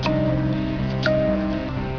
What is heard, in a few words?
timer